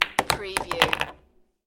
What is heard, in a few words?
Billiard, Pool, Snooker - Ball hits other ball which falls in pocket